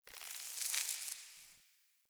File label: Foliage Growth C; Powers; Fey Eldveil; Eldveil Stillness